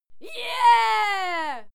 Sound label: female cheer yeah throaty; schreie; menschen